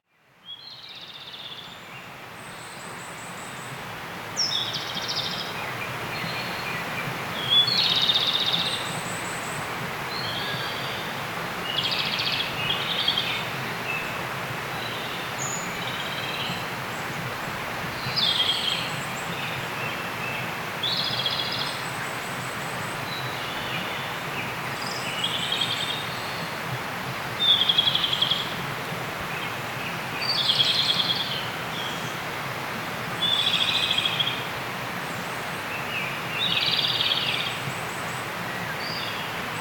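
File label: komadori, Japanese robin; bird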